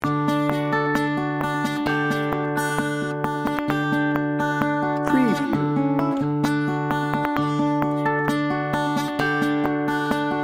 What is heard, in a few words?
dream maker no lead guitar